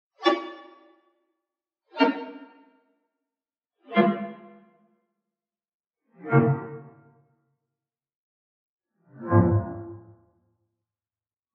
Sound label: 3d Digital Artifact